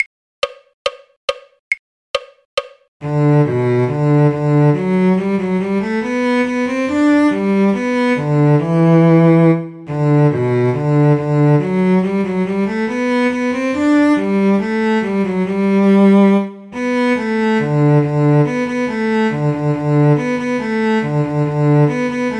cello; soldier soldier wont you marry me; folk

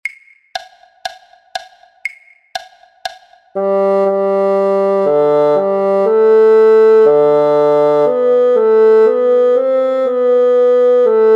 BASSOON; Easy Bassoon Christmas Carols Book One

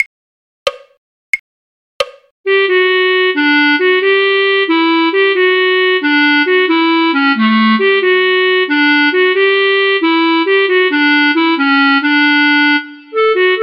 clarinet; folk

